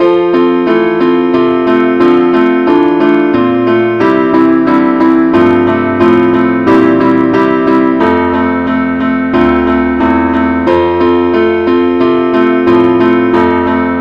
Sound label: keyboard only key F